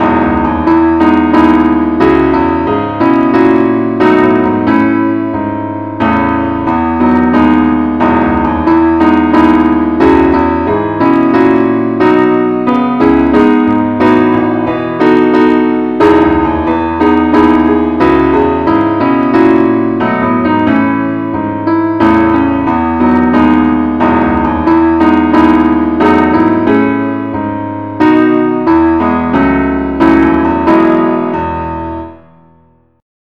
mcintosh keyboard only key e; Amazing Grace; John Newton

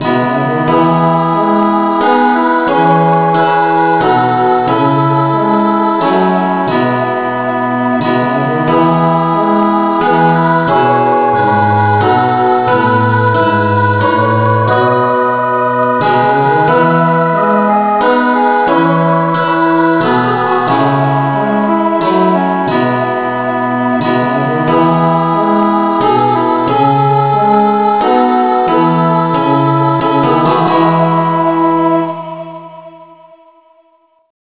no vocal key e

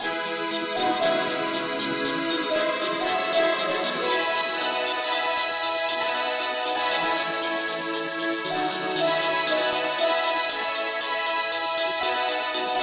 lead key E

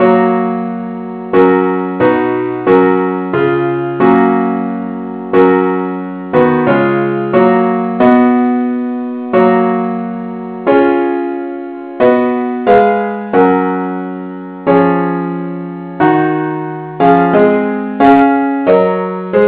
christe Sanctorum parts only key ges

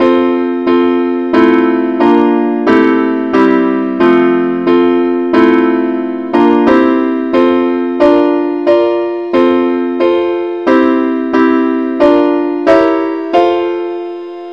advnceacc key f; christe Sanctorum

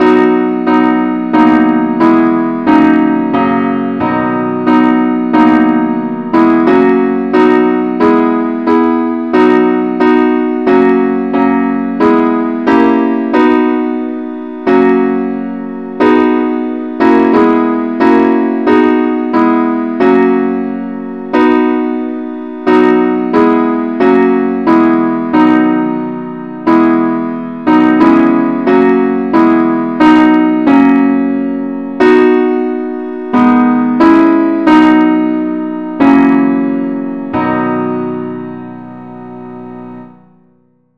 advnceacc key b; christe Sanctorum

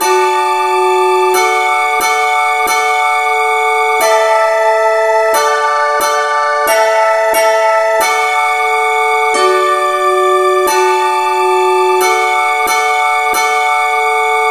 no vocal, key D

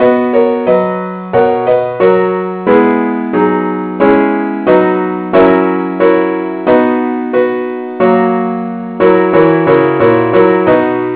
gwalchmai keyboard Only key b

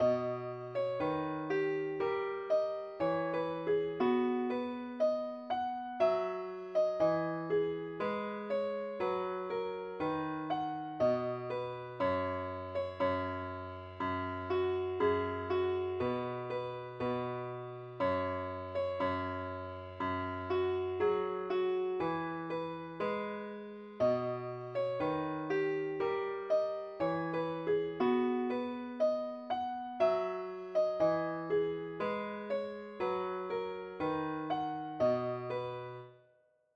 pilot mandolin keyb

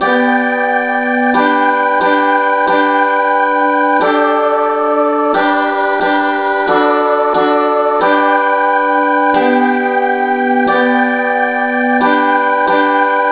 no vocal key g; henley

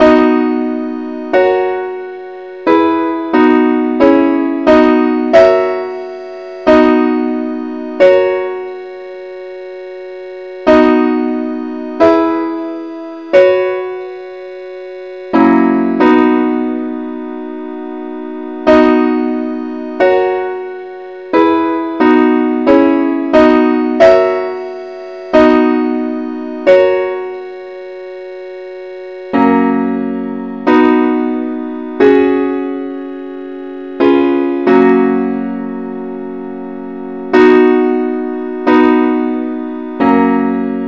simple Acc key B

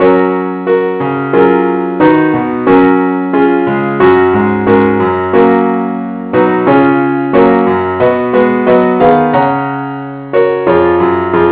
keyboard only, key fis; christe Sanctorum

